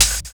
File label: Hi Hat, drums; Sonic Foundry (Sony Creative Software); Drums & Drones; Construction Kits; Skip Kit